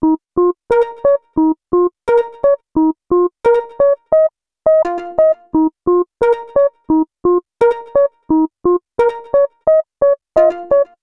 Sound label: Melody, Hip-Hop